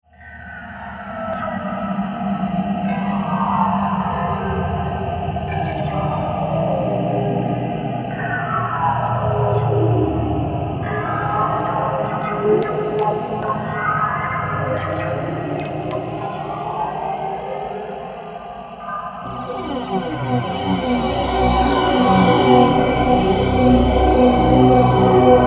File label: Drone